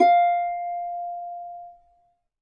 F4 HARP; USB Soundscan vol.62; AKAI

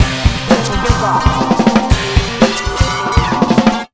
beat, Electro 125, Big Beat Drumloops; USB Soundscan vol.25; AKAI

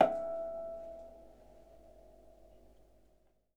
H E5, Percussion & Harp; Miroslav Vitous

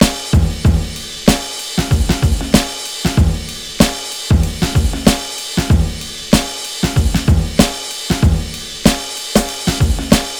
95 Huge Hits, Breaks; Lockdown Breaks

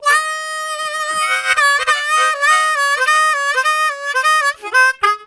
High Breath, Harmonica Riffs; Zero G

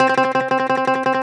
BALALAIKA, Prima Balalaika, Plucked Strings

